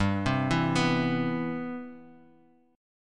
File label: C Major 7th